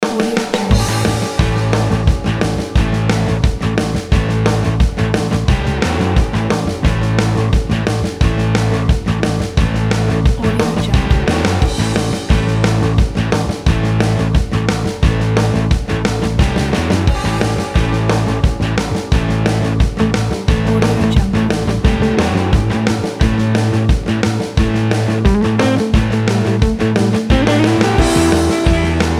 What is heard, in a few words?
funny country rock background